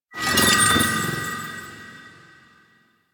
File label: Bridge Collapse; Warframe; Cephalon Weave